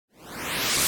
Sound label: Intro Ramp; Burning Temple